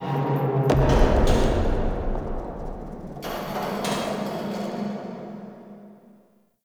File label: Cave Mine Distant Boom; Ambience; Midrath Island